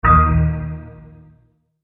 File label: multimedia tone error